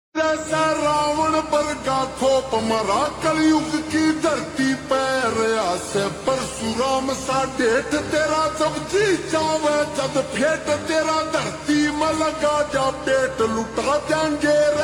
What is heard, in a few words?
Ringtone Slowed Reverb